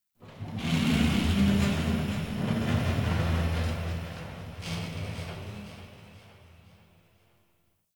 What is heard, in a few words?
Metal Movement Texture Large, Corpus Ship ambience; Textures